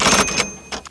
maquina escrever; sons trabalho

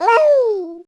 bebe, sons humanos

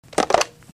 telefone pegando; sons trabalho

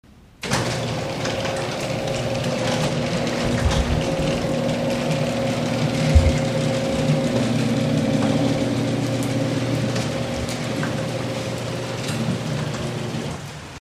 portao eletrico, sons domesticos; pacdv

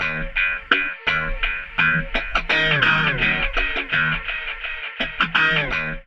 echo riff, loops guitarra; sampleswap

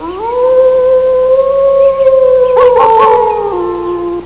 lobo; sons animais

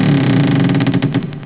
rangendo, Sons domésticos; truquesedicas

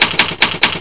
maquina escrever; sons trabalho

